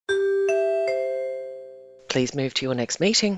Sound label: chimes fast please move to your next meeting; nbc chimes fast